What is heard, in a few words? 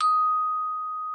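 xylophone D#4; Xsample VOL-4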